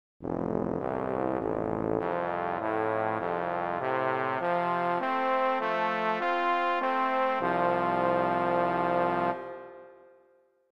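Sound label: bass trombone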